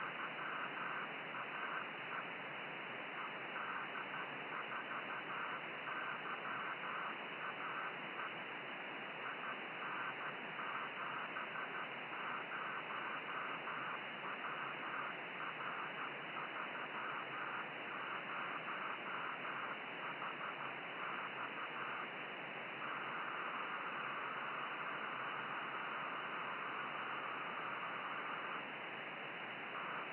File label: 10ghz rain scatter; ed4yae